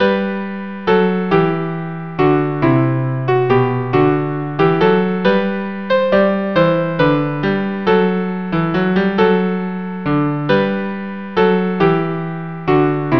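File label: galliarde violin cello; frog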